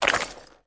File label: male mud, footstep